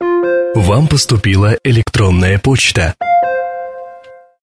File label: received email; ringtones